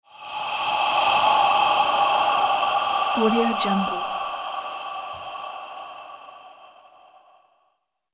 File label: ghost breath